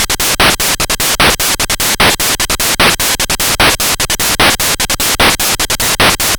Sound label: NES Combo Loop, Drums, 8-Bit; Sonic Foundry (Sony Creative Software)